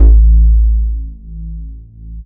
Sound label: dance, bass; Techno Trance; Techno Trance Essentials